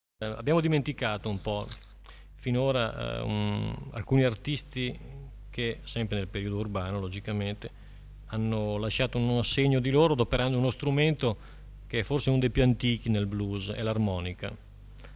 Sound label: Blues; Blues Workshop